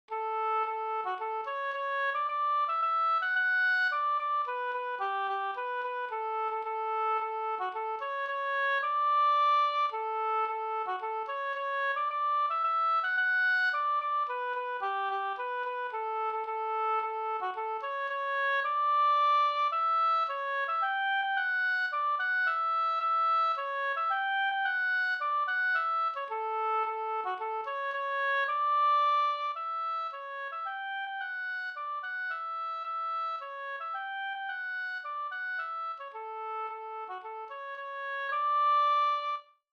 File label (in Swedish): hamburska; Gula skinnbyxor Sd